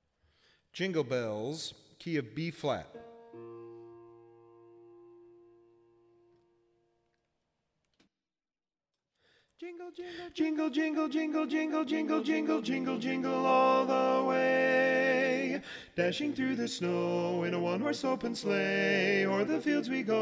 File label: Jingle Bells lead